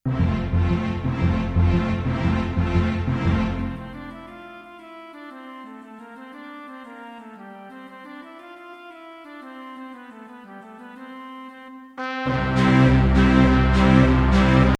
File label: rollicking band; PIRATES OF PENZANCE